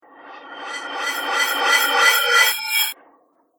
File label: Cymbal Resonant Whoosh